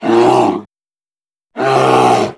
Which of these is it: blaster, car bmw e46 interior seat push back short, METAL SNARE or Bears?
Bears